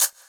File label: Shaker; Equipped Music; Loungin House